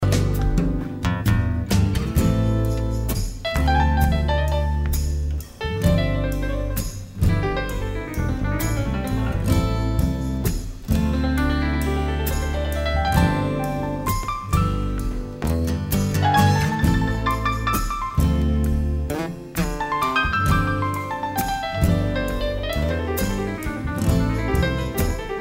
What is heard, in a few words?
piano solo